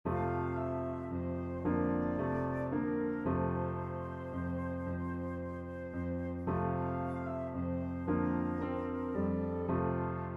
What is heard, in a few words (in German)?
Vom Himmel hoch o Englein Flote Violine